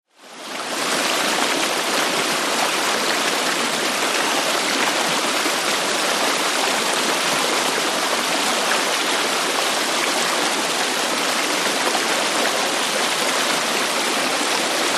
River Med Dist Splash, rivers

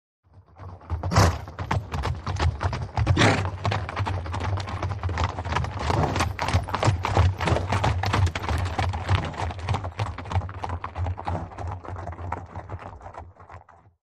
By Group Horses Trot P

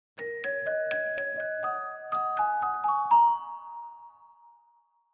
Clavichord Chinese

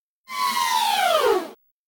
Crying Motor Down, servos

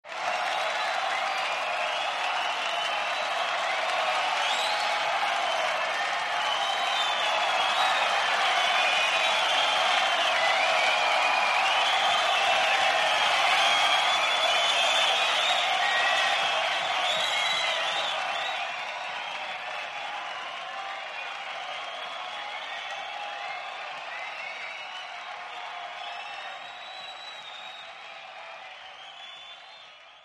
Rock Concert Big Crow

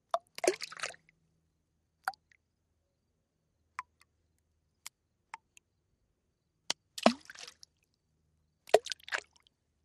Splash Sml Stone Plop

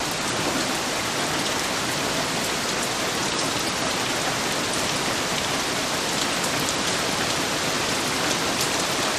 Rain Heavy On Water

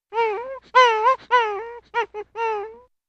Funny Dog Whimpers

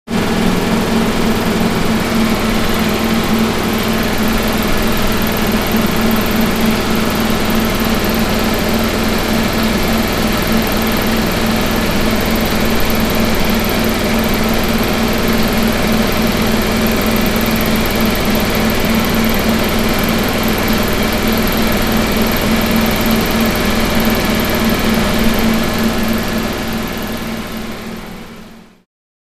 Generator Large Mot, factory

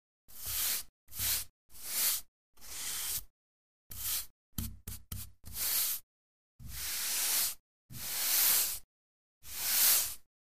Billiards Brush Tab

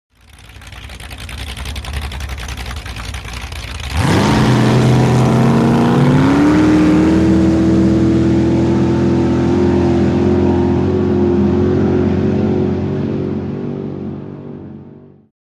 Drag Boat Idle away

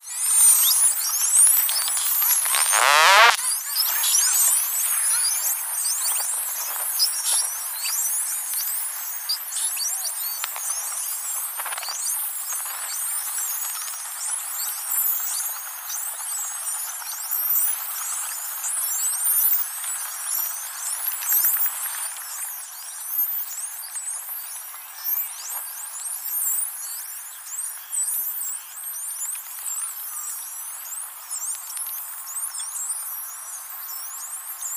Dolphin Spotted Whis

